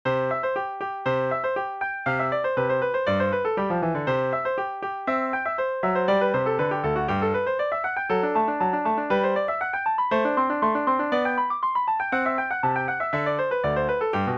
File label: sonatina; clementi